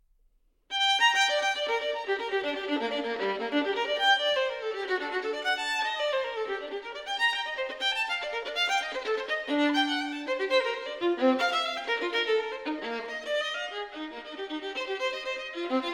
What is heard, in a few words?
violin sonata in G minor, presto; violin sonata no 1; bwv 1001